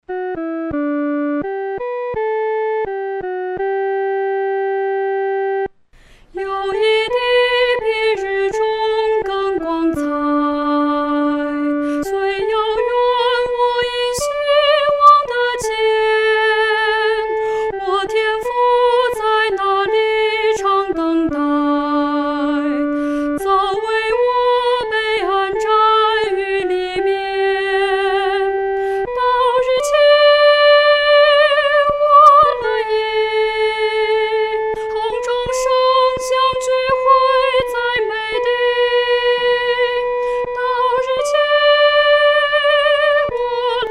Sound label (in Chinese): 独唱 第一声